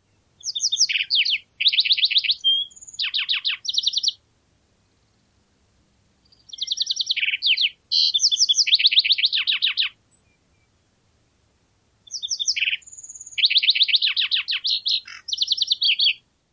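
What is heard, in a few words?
songs, lark sparrow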